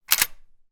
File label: gun marlin 336; still north media